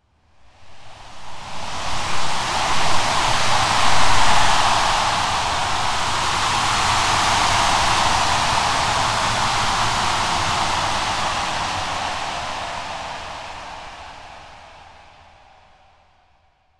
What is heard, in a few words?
Wind Water Aura